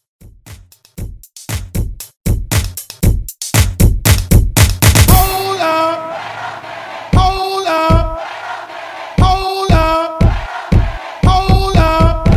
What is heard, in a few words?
Throwback Party Break Intro